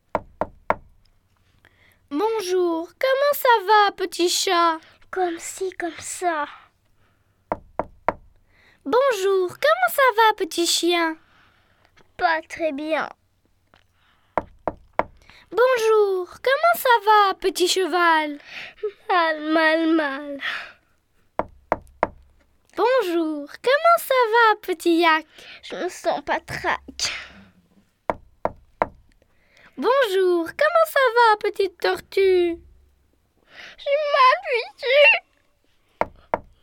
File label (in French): Les animaux malades chant, Version chantée